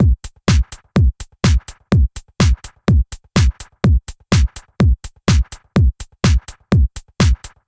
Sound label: 125 Bpm Hard Minimal Beat